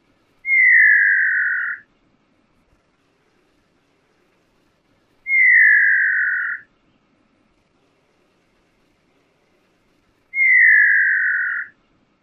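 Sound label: song, bird; AZ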